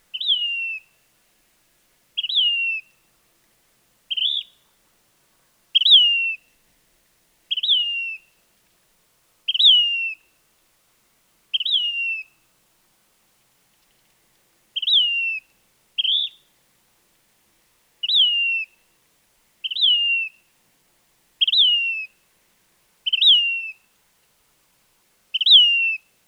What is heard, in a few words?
Sayornis saya; Chordata; LandBirds